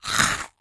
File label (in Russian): zvuk ukusa